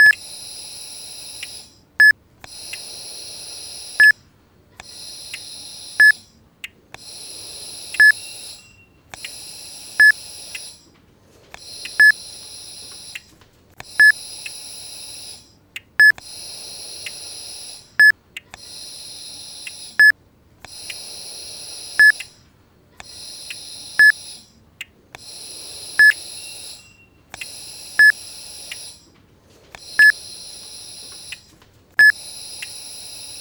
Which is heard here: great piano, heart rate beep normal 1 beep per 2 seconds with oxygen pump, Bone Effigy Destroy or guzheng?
heart rate beep normal 1 beep per 2 seconds with oxygen pump